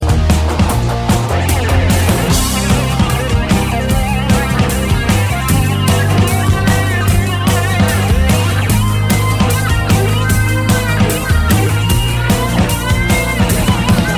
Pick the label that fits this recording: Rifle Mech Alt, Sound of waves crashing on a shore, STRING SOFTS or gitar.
gitar